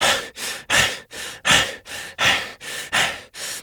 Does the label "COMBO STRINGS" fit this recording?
No